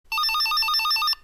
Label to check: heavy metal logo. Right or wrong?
Wrong